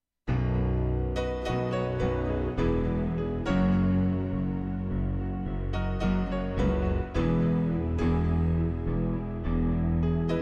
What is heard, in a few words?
vocal